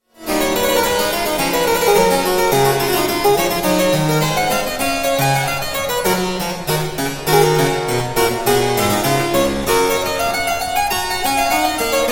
sonata no 3 in g major for harpsichord

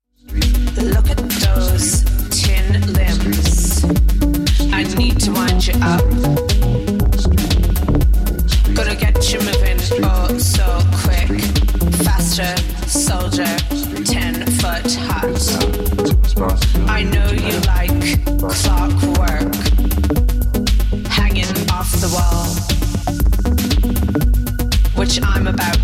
remix

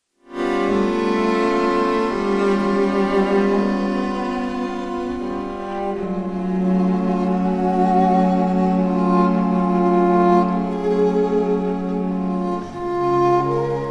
string quartet in g minor op 10; claude debussy ebene quartet

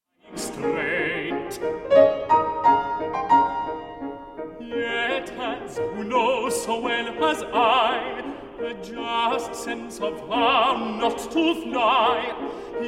2 songs op 28 for voice and piano; ian venables; no 1 flying crooked

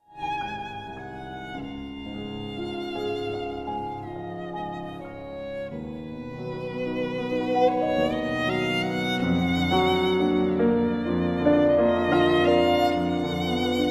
violin sonata in A major; gabriel faur; op 13; with renaud capucon violin and michel dalberto piano

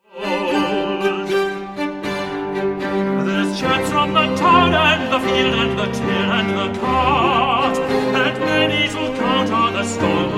song cycle for tenor string quartet and piano; ludlow and teme

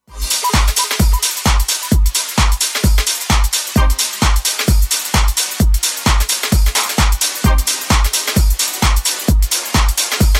bassboy takeoff

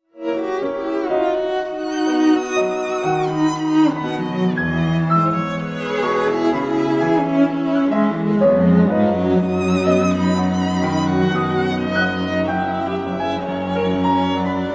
c minor piano quartet no 3 slow mvt

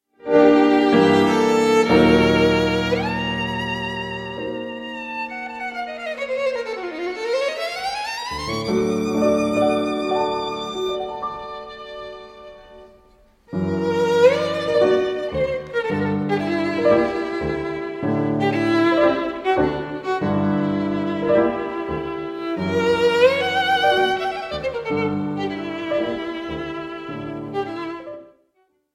tambourin chinois for violin and piano; maxim vengerov; fritz kreisler; itamar golan

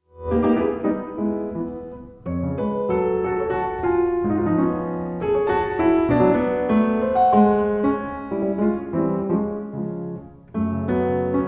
piano sonata in e minor, scherzo trio

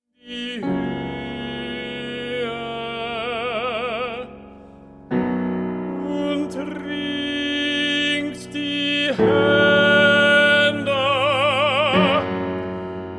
song cycle for voice and piano; schwanengesang; d957

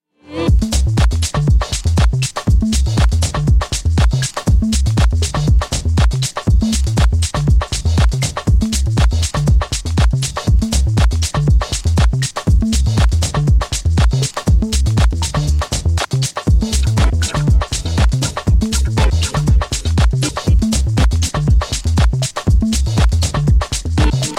percussions

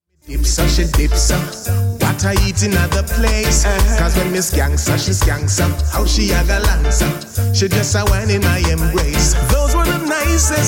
real reggae music

